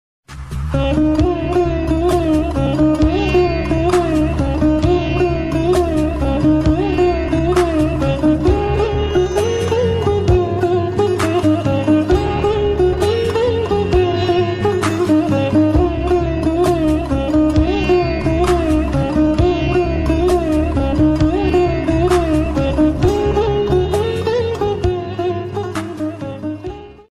sad instrumental ringtone, love hindi song ringtone